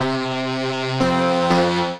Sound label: FM Melody Rack Root C, Complex Vocal Racks; Voice Box; Harmonic Vocal Racks